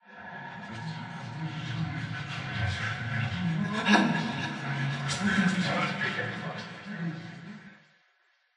Voices Ambient

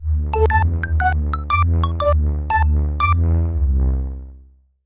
Nogoal

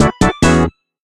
Box Epic; Smashy Road Arena android ios 2016

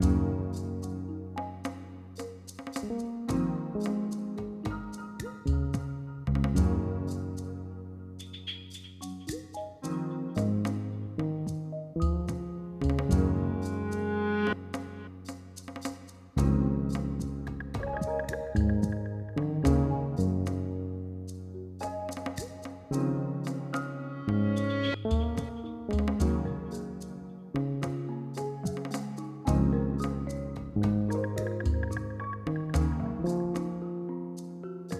Rainfall (Working); Pikmin 3

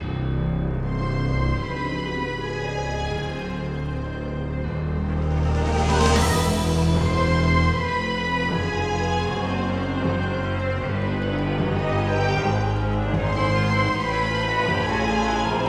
Battle Theme

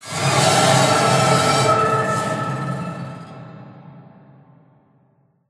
Combat Mix Samples; The Sinking City

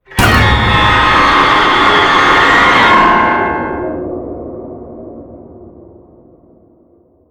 Stingers; Outlast 2; PS4 Windows Xbox One

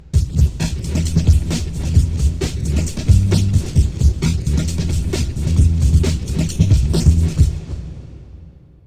Ditty

